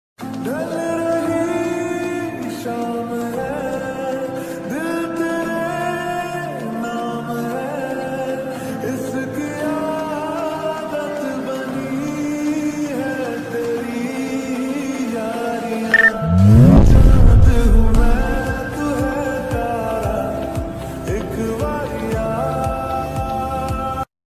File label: ringtone lofi remix ringtone